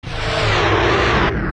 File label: Ganon Dizzy